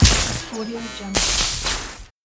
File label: short circuit electric